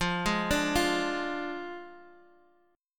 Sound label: F Minor 6th; Fm6; arpeggiated; 13,11,12,10,x,x